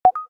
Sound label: Sound on Silent mode off; Samsung Z3